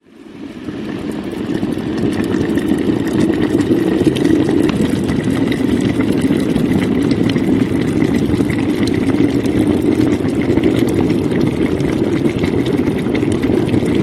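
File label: sound of a boiling kettle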